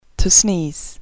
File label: sneeze; english